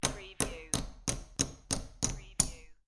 Poker Chips Place Chips On Top Of Stack One By One